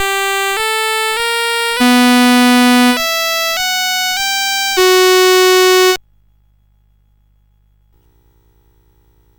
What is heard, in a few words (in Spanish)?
Vals, CSG8580R5; Vals del Duende